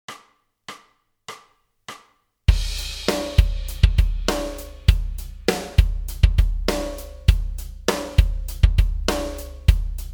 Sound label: Tap Slides Backing Track